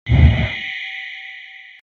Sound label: Shadow Attack; Sfx Baphomet Shadow Attack